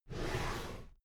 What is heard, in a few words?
drawer cabinet paper open regular halfway